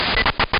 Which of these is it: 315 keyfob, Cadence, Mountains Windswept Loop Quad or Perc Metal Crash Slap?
315 keyfob